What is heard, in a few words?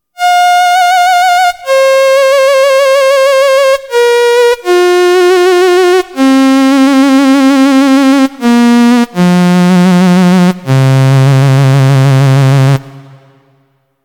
simple vca